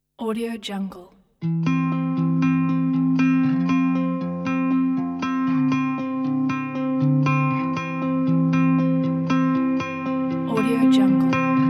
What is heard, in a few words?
heartwarming kindness